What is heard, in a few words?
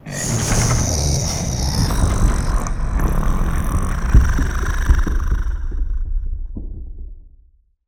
lithoid portrait snake; lithoids; Stellaris